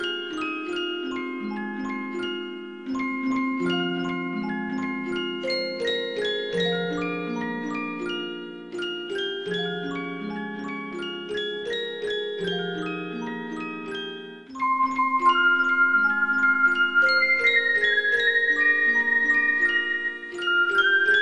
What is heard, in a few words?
ocarina; meloboom